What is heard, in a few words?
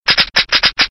dryfire; Defective Turret; sp sabotage factory defect